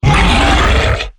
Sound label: Exertion Heavy, Behemoth; Evolve